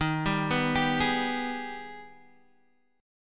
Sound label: Ab Minor Major 7th